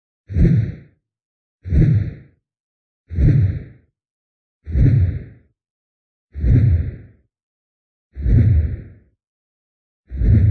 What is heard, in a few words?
Swooshmania Reverbed